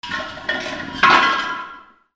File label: BANGS